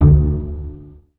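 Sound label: DOUBLEBASS pizzicato D2, Virtuoso Strings; ILIO; Kirk Hunter Virtuoso Strings VOL-2; 90 s Sample CDs; 5DOUBLEBASS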